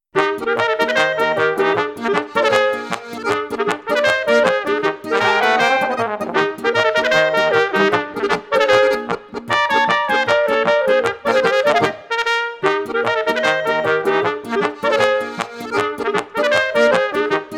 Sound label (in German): Nachtmusik Polka